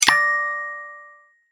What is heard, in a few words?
Email Receive; Tinno ATT Calypso 3